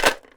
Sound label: ELM RATTLE, PERC; AKAI S6000 CD-ROM - WorldSounds